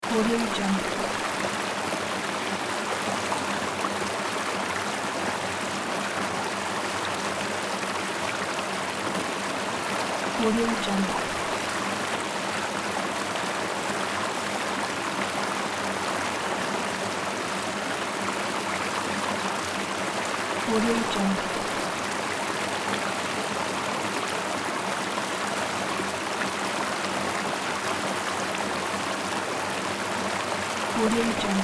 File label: waterfall